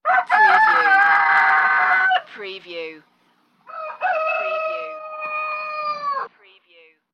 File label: Rooster Call Double